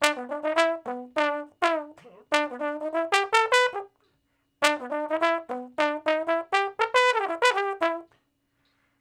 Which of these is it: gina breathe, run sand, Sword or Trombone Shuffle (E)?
Trombone Shuffle (E)